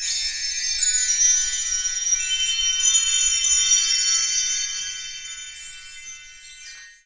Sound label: BELL TREE; 3D Sound; 3D Keys VOL-1